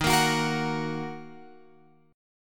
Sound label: D sharp Minor, Guitar, Open F; strummed; JGuitar